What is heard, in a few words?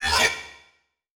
Multimedia Internet CD-Rom Flash Element